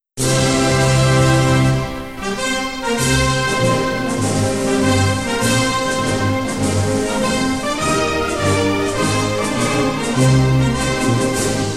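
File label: very short instrumental anthem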